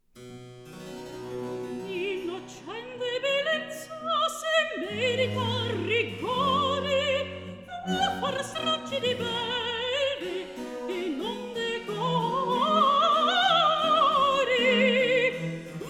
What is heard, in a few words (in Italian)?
Recitativo; Innocente belezza; Baroque; Alessandro Scarlatti; Duet Cantatas